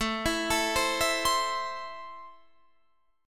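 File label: A Minor, Guitar Half Step Down, chord strum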